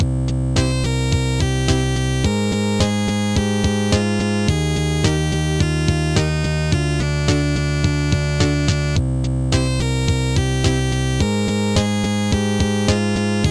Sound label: chiptune; zombie